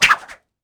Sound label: Hungryfrog; Levels Hub Bayou; Target Hungryfrogs; Hungryfrogs Tongue